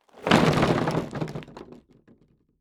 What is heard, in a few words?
Crashes Concrete Debris Drop On Hollow Wood